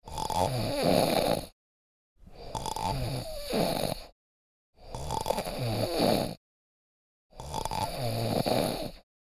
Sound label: Man snoring violently long